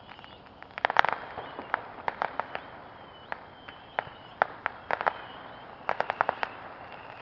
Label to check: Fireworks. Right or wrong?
Right